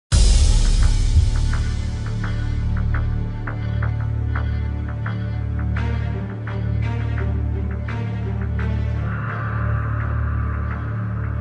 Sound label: bg music; cod